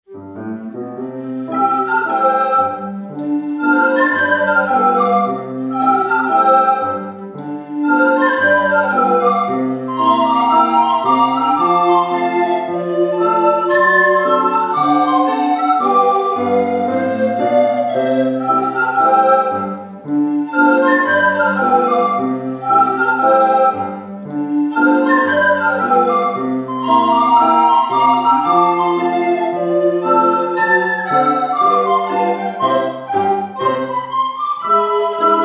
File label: Shuffle